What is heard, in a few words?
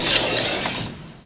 SOLDERMAN; Northstar; The Wizard VOL-1